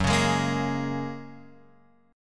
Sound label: F sharp Major Add 9th, Guitar, Open F; strummed; x,1,1,3,1,3